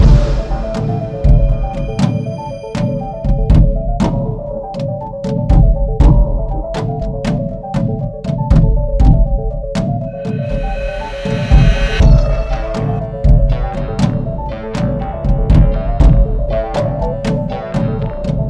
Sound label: Tech Ambient